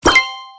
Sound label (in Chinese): 喜欢评论点赞提示音